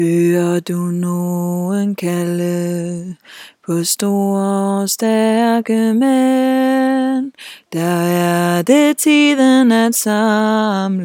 tenor; Arbejderkoret

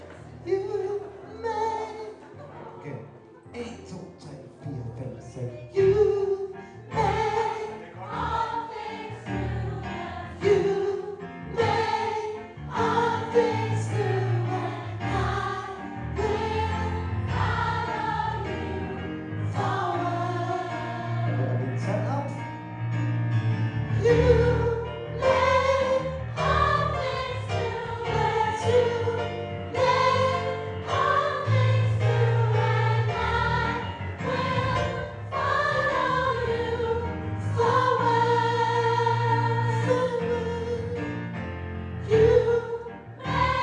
moving forward sopran

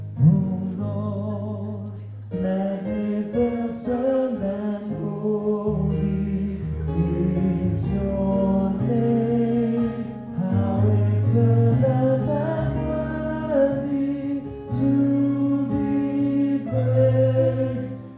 tenor, kor

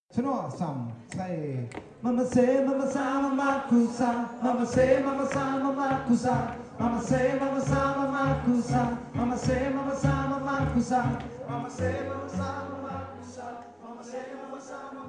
tenor, kor

